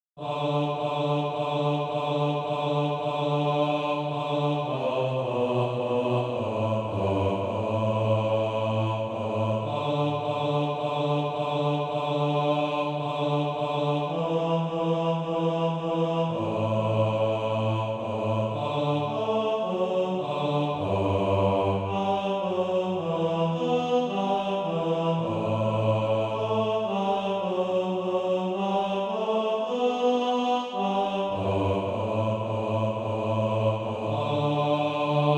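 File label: bass; SATB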